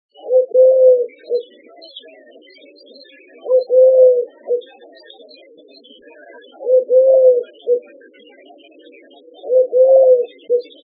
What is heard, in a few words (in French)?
Tourterelle, Oiseaux; Sons Oiseaux